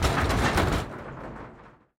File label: truck rattle; tanks; War Thunder